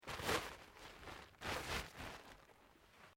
Plastic Sheet Smoothed Folded; Material components for constructing more complex sounds

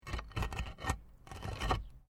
PAVING STONE MOVE, dragging

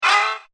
Fast Laser Whoosh; whoosh sound effect; Whoosh Sound Effects

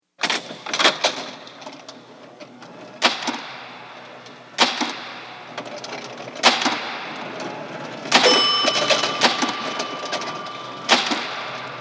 Rifle Gun Game; Arcade Sound Effects; Games

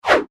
multimedia max min interface sound whoosh min; Multimedia Interface ' Minimise ' Sound ( Whoosh Style Tone ); Sound Design; McKinneySound

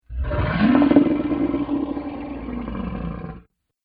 Dinosaur Trex; Dinosaur Sound Effects; animal

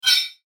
Mouseover Airy Whoosh; Multimedia; Blastwave

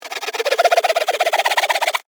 Saw Fast Funny, Cartoon, Warble; Cartoon Sound Design; BlastWave

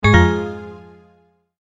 multimedia tone error

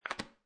Foley, Deodorant Stick, Put On Plastic Cap; Lids; Objects

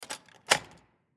Door Gym Close; Miscellaneous Doors; SoundEffects-Blastwave; Sports

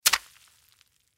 Household Mop Wet Smack On Floor; Cleaning; Blastwave

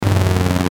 Chopper Electrical Distortion; Blastwave; Chopper Sound Effects

